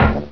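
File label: apple bounce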